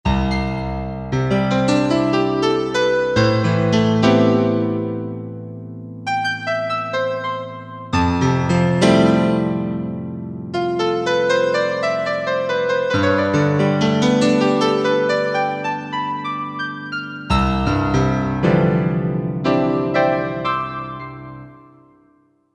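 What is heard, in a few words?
Stereo Piano, Korg MSC-1S; Stero Pf; Korg MSC-1S Variety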